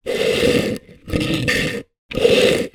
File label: household bucket plastic small drag on hard floor; zapsplat